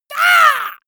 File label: monster painful cry high